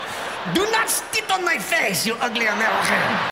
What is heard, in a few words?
spit